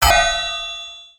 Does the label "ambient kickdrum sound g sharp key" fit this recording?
No